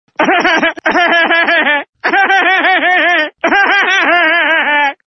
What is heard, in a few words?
huehuehue